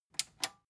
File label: metal button tiny click disable slow